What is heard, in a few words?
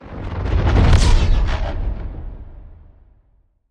Electro Whoosh Hit; Quantum